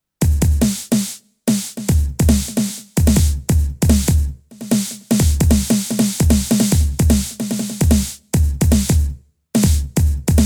Roland TM 2 DubStep

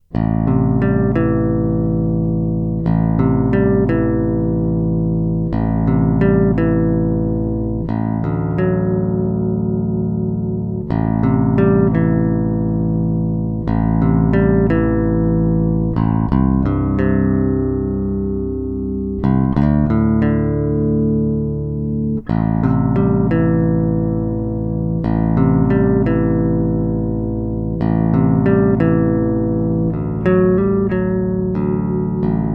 Both Pick Chords

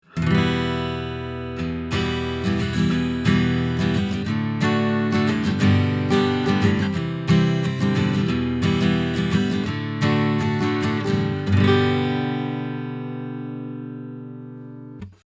Beyer M70 Pro X A Guitar 20cm 15th Fret

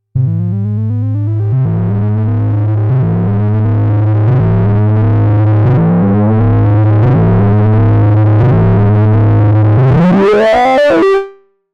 12dB SVF 6dB BPF Overload Resonance Dist; GRP Module

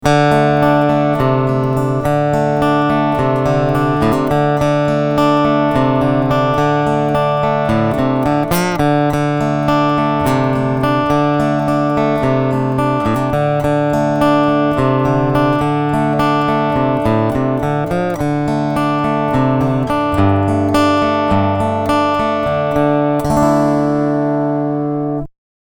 Picking EQ Flat CT On

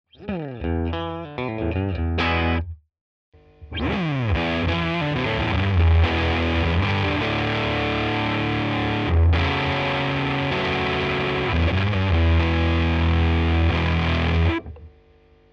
Walrus Fundamental FUZZ Mid

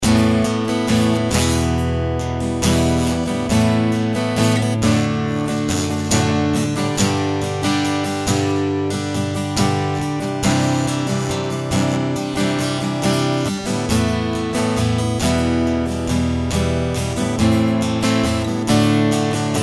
Slow Strumming

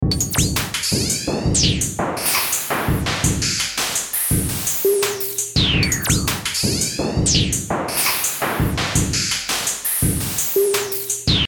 White Noise Kit mit One Shots

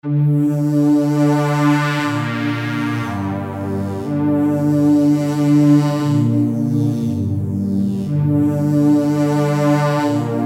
Bass Tube Voltage PAD Macro Modulation; Heavyocity Mosaic

